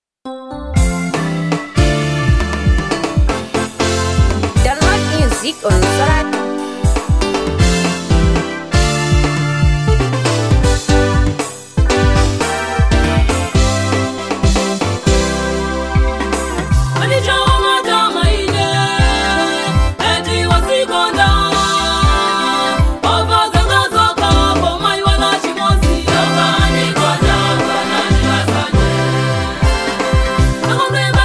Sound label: Choir; Golden Bells Choir